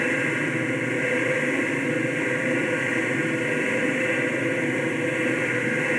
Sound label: Panned Organ, non tempo loops